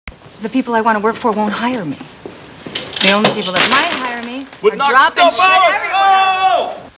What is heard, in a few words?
dropping